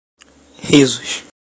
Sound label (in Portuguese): risos